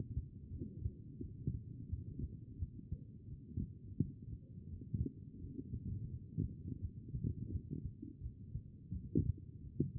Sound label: chest; B MODE